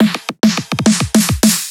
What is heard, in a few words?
Riddim Drum Fill 140